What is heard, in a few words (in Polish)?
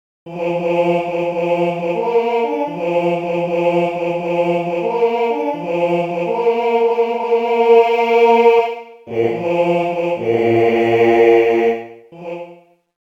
Krolik na rozkladzie, sygnaly mysliwskie; sygnaly mysliwskie ze slowami